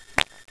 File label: fart